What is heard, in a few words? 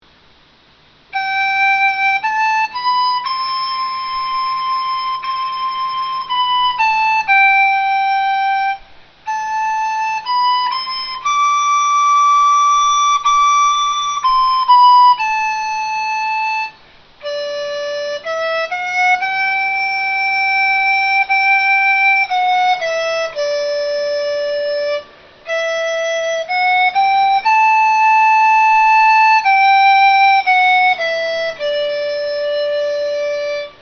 dizi